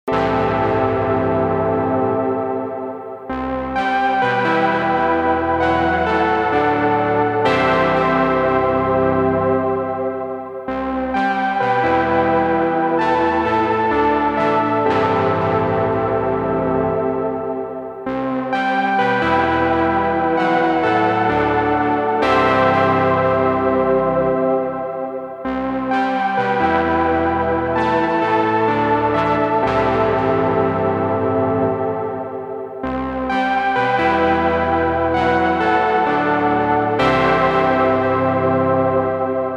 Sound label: EPiano Filter Bass Pad Stack